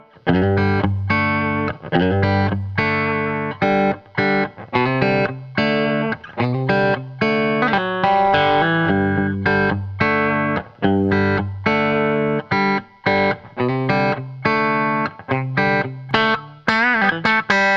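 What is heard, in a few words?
Country Guitar, Verse 1+2; Digidesign; Pro Tools Essential Loops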